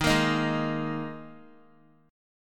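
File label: E flat Suspended 4th Guitar, chord; Ebsus4 strummed; x,6,8,8,8,x; JGuitar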